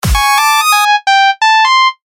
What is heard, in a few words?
Alien Alarm